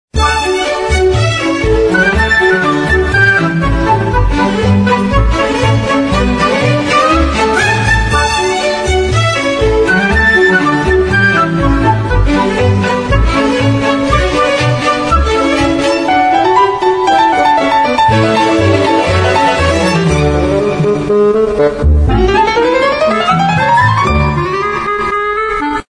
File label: Ringtone